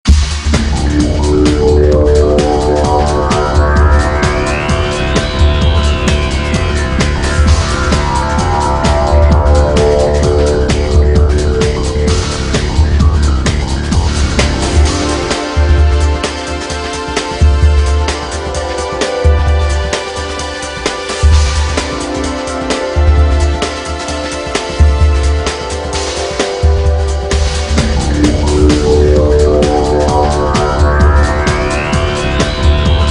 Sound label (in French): Ambiance; arrivee sur lyoko